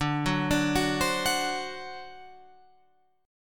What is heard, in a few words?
D 7th, down, Guitar, Open F; arpeggiated